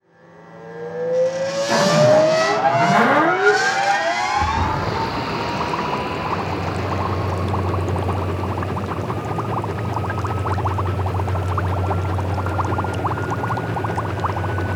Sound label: scuba sub start and run; ocean-commotion